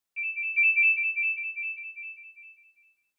Peal, phone tone

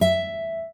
Classic Guitar, notifications; Oppo Find X5

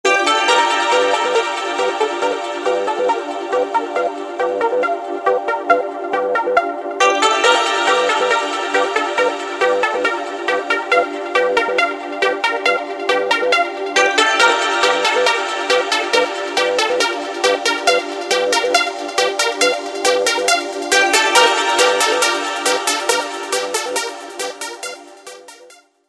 urban elegance, Motorola; A3100

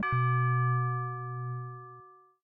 Single Doorbell; Samsung Galaxy Z Fold 7; Calm

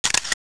Camera Shutter

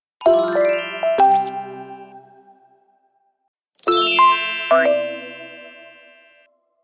power on + power off; Samsung GT-S3030C Tobi

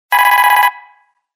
Minimal tone, Samsung; GT-E2222 Southeast Asia

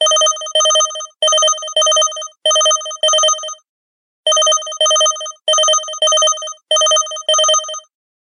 simple beep, ringtones; LG C729 DoublePlay

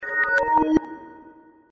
Teleport, notifications; HTC Desire

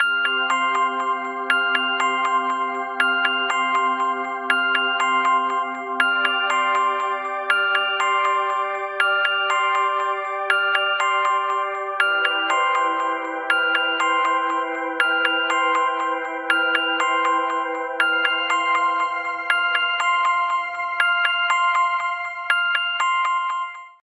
Simple Alarm, alarms; LG Optimus G F180K

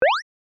message, notifications; K-Touch; Nibiru-H1

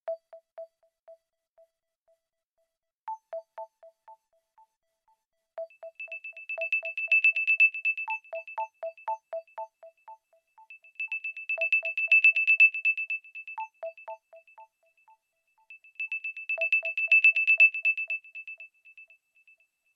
Radar, alarms; Tecno; Pop 9 4G

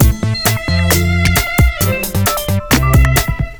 ringtone, hiphop rnb; Google; NewWave Labs

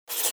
Key Tone

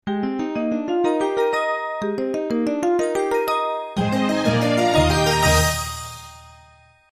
Tone, Nokia Ringtones; Nokia 2610b; att

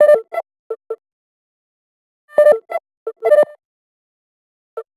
wizard, ringtones; Nothing Phone (2)

